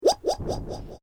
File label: Created Creature Small Ping, Alien Sound Effects